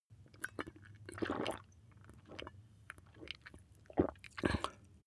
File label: glass bottle drink swallow sequence